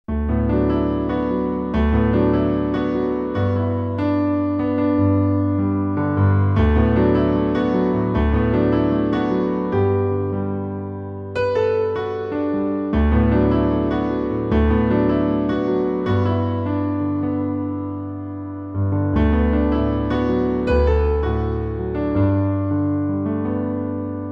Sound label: acoustic piano, music on hold